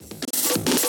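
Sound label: drum beat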